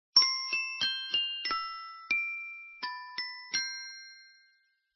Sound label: Respiration